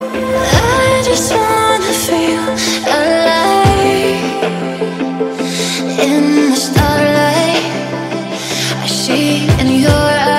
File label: most famous English song ringtone